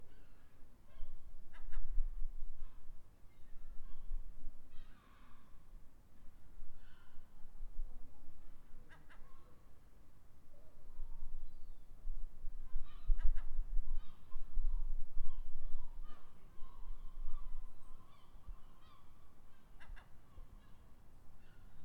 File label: Seagulls